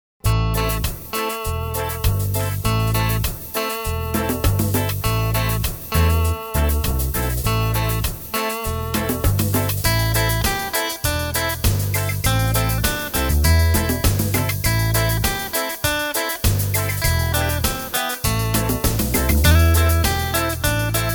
reggae

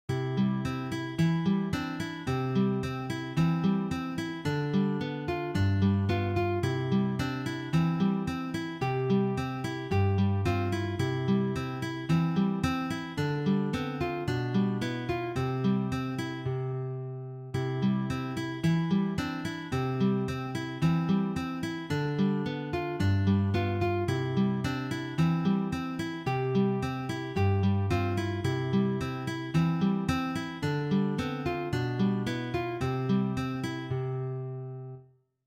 go tell aunt rhody; traditional; 2 gitarren

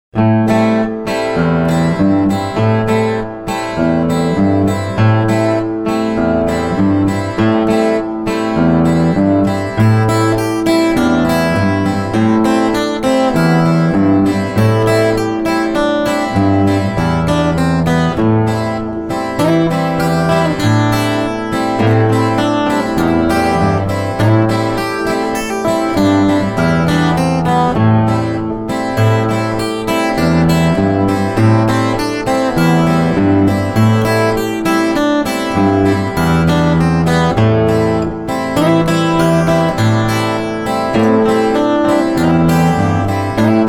regga, 2 Gitarren; blues; ragtime

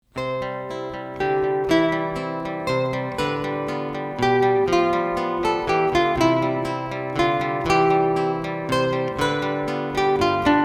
praeludium, 2 Gitarren; klassisch

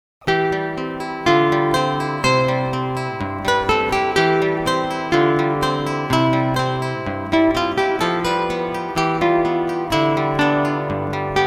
praeludium, 2 Gitarren; klassisch

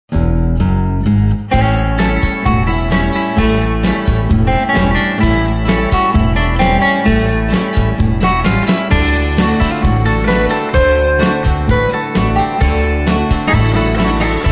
country blues, band

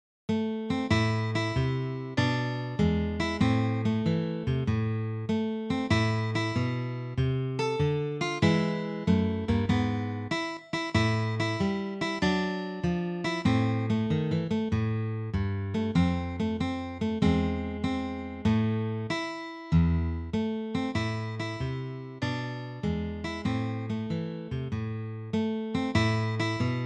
st james infirmary, Gitarre; traditional

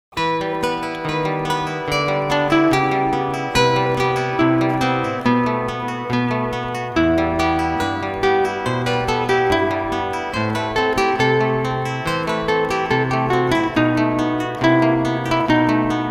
praeludium, 2 Gitarren; klassisch

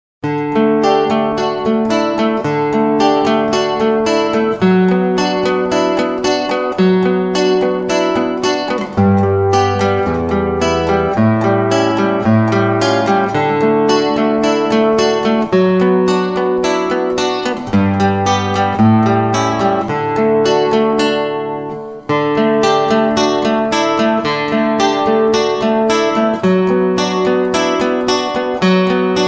intermezzo, Gitarre; klassisch

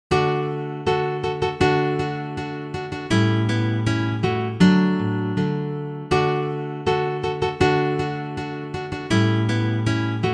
ritsch ratsch fidirullalla, 3 Gitarren; traditional

